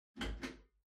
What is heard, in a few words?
Ceiling Light (Far Pull)